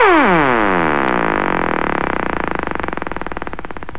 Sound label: funked out, Zaps and Beeps